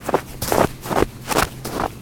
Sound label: Running, baseball; FOOT